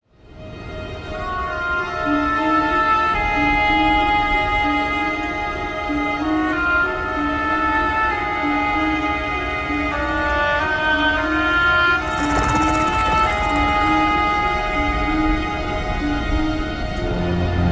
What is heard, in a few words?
Music; Voldun; WoW Battle for Azeroth; BlizzCon 2017